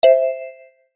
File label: Collect; Bunnicula - Crop Control